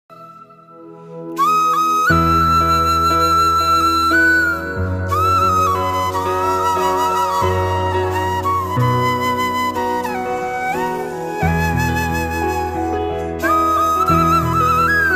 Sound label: Amazing fruit flute music song; farming